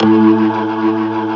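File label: big Hammond fast A2, keyboards old school; USB Soundscan vol.09; AKAI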